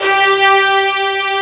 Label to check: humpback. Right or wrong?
Wrong